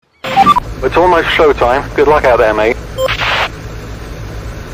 Team Radio Effect; F1

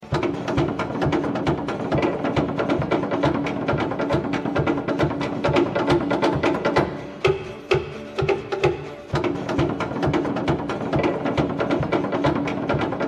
Maori Drums

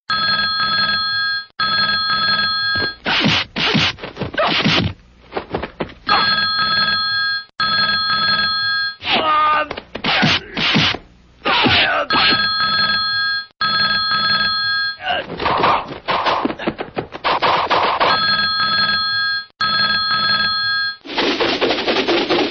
Kung Fu Ringtone

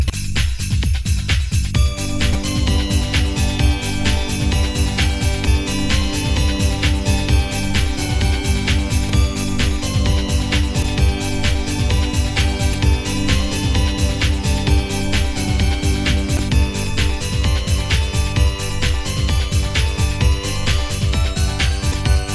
Deck The Halls

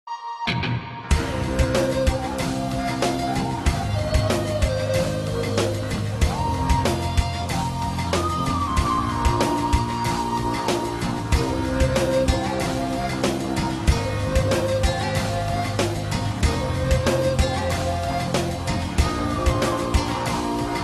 Flute

